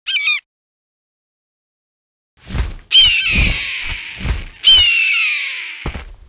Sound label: Griffin idle; Seer; GamigoNA